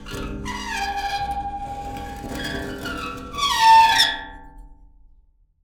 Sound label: Pane Of Glass Rub